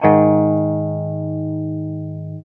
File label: guitar 5TH